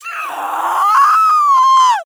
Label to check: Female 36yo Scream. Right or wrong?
Right